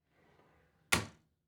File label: Door Cabinet Door Close; household